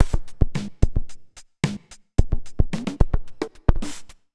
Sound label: Bent Korg MR-16 (110BPM), cyborg beats; musicradar